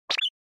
Motion Agility; モーション 俊敏

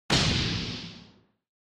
Motion Fall Long

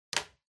Lighter Put

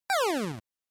NES General Pitch

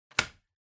Wall Outlet 2 Pin Unplug Hard

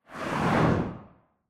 Camera Motion; シーン・カメラモーション

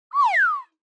Slide Whistle Overtone Down

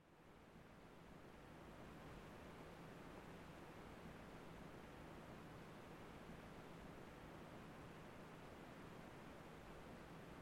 PC Operating Noise Far Room Noise

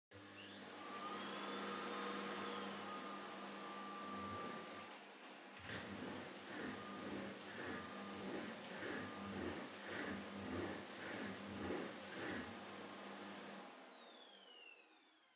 Vacuum Cleaner Far Vacuum Floor Wood Low

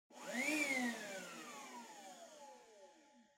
Vacuum Cleaner Far Vacuum

